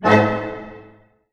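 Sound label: D# minor pianissimo, orchestra hits; Sound On Sound - Peter Siedleczek's Orchestra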